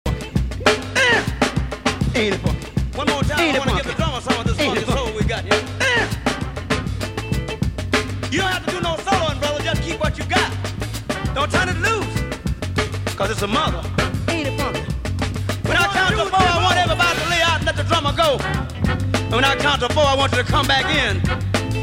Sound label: snipps